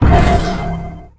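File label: Quick skill Harm Defect; Gamigo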